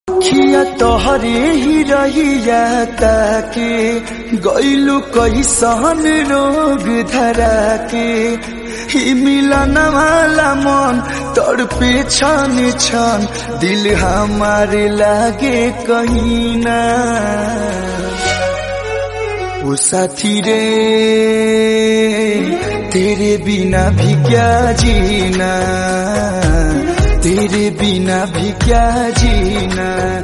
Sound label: Bhojpuri Ringtone